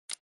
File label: Chip